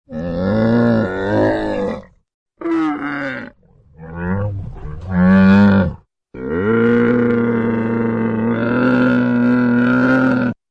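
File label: camel groaning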